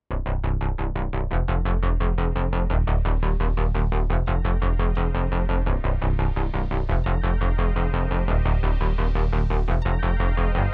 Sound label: lfo bass; Slipstream; Slipaway